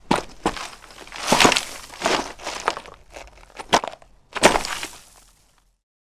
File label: Footsteps walking and falling on dirt